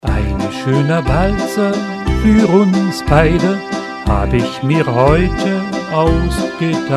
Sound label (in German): walzer